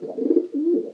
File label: pigeon idle, creatures; Counter Strike Model Pack; CS:GO